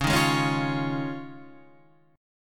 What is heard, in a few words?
F Minor 7th Sharp 5th, Db